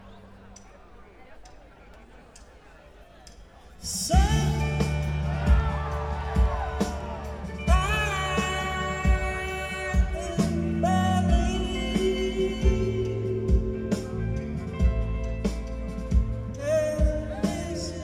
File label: drum solo